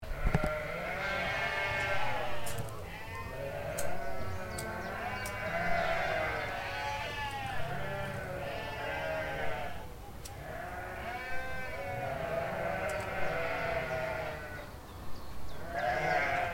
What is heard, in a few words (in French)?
le chant des moutons